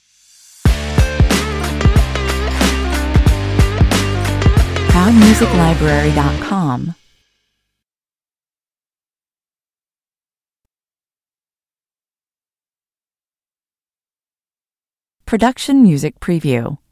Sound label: brave beats (stinger)